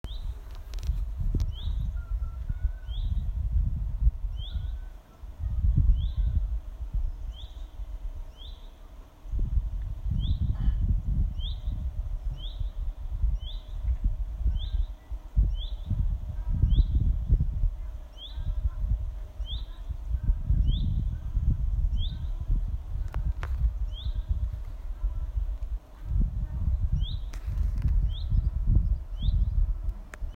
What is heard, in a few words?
Bird